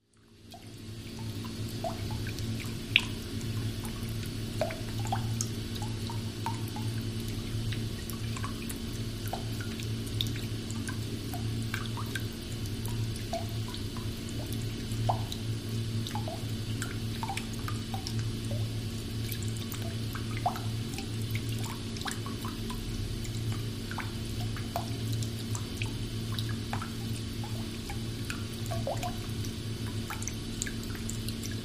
Sewerage Amb Broken Fa, Sewerage Ambience Broken Faucet